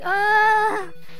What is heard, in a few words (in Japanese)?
ダメージボイス; 悪魔城ドラキュラ(FC版)